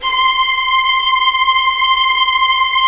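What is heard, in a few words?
Strings Violins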